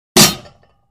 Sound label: Free Weights Exerci